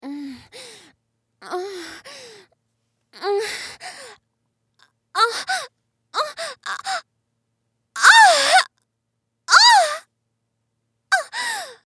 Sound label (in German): Orgasmus schnelle Nummer